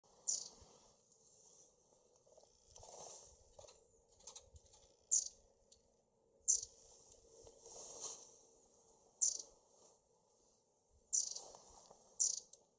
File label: shima enaga